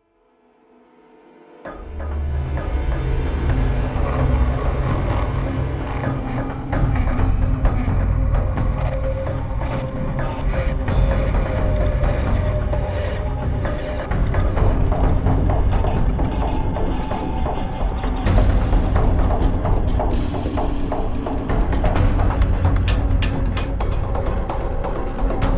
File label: Music Action Scary Rhythm; starcraft 2 wings of liberty; MissionMusic